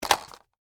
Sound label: Skateboard Flip, Mini, Up, Cement, Surface; Sports; Skateboarding; BlastWave